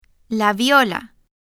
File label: viola